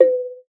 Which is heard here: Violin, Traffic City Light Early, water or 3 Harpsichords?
water